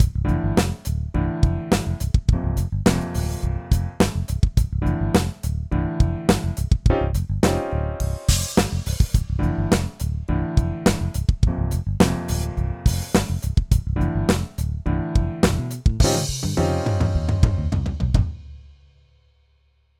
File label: Heritage Audio Successor Limiter Att 50µs Rel A2 50 SC LP 160Hz